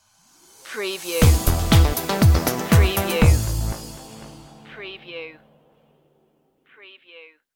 neutron groove stinger

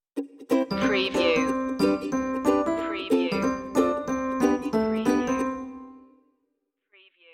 reggae happy stinger

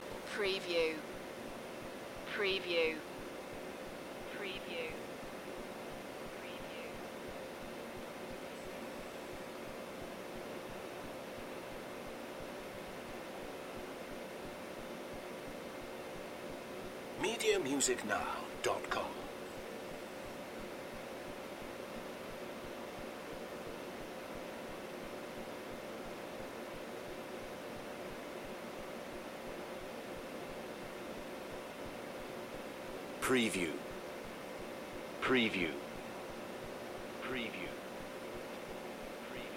Office Room Tone

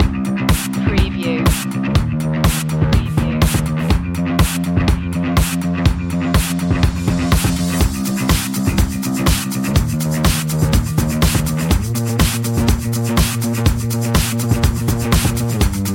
bass and drums

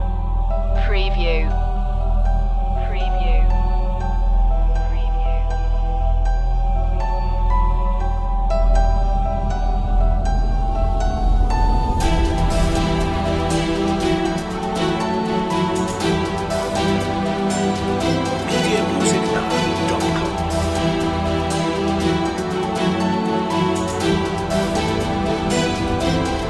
action movie trailer

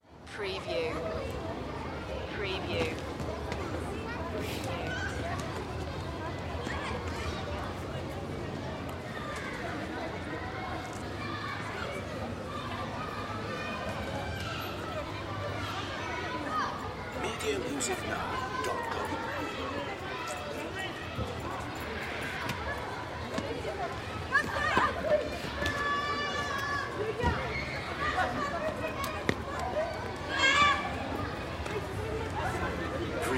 France Schoolyard; sound-effects-bible

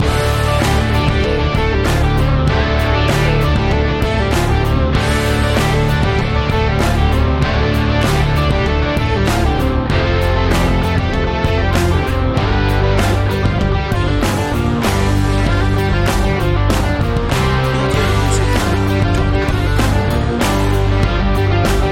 texas rocker loop

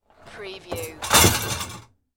Dishwasher Rack Slide

